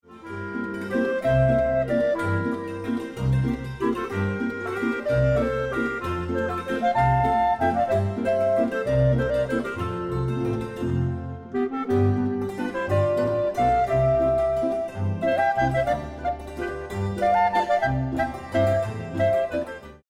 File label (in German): walzer